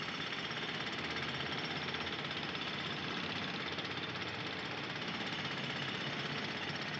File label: Pneumatic Drills